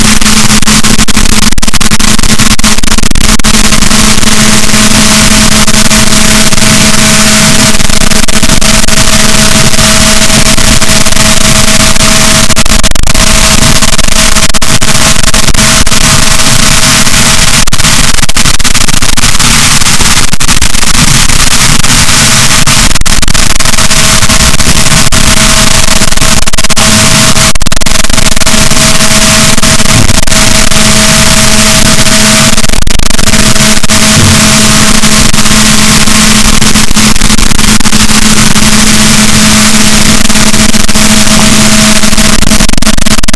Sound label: rorquals, Balaenoptera Physalus, boat